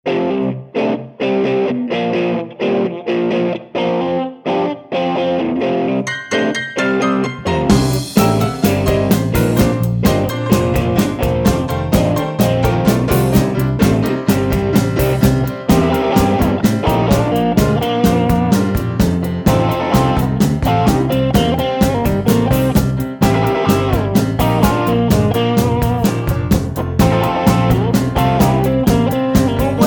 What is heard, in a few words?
Classic Rock, variety band